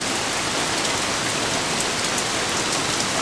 rain ground; Quantum Redshift

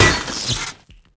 electric panel, bullet hit; projectiles; Max Payne

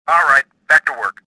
confuse; worker2

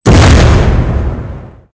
boom, frag grenade; weapons; Soldier Of Fortune II Double Helix; XBOX

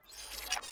Focus In; Tom Clancys Ghost Recon Advanced Warfighter; XBOX

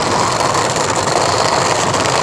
grind wood railing; terrains; Tony Hawk's Project 8; XBOX

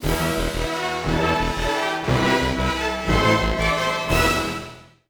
victory; Spider Man 1; XBOX; ENGLISH

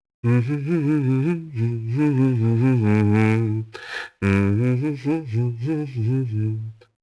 mobster noise; enemy; Max Payne

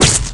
Bullet Shield, Impacts

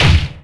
boat hit; Splashdown; XBOX; Impact

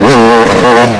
throttle, YAMAHA

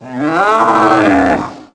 Death Roar, Ice Beast

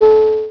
440Hz Tone; Freestyle

